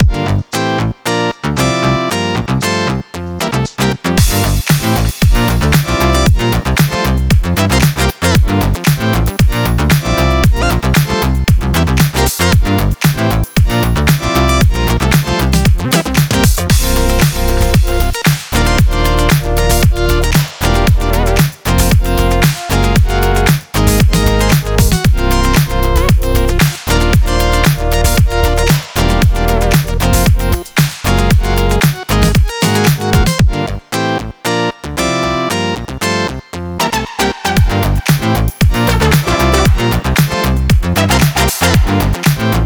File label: Music Upbeat